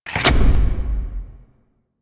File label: Seance Deactivate; Warframe; Harrow; Donda